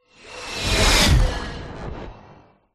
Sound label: sound design cinematic whoosh impact futuristic sci fi; zapsplat